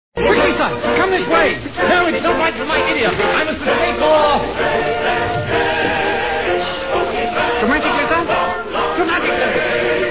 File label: dramatic